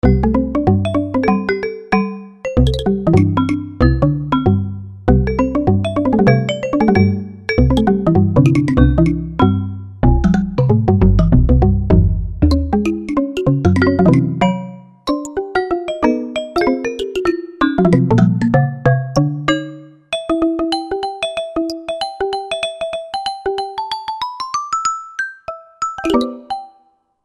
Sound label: Exotic Velo, Roland JV-2080